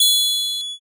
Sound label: glass toast